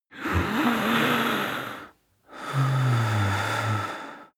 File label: breath male moan